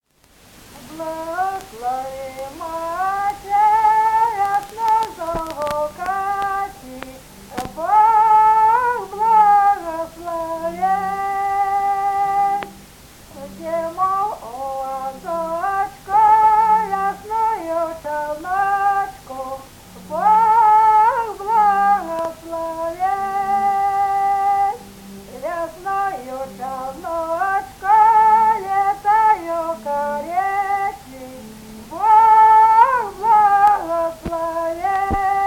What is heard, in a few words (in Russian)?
Благослови, мати (гукальная)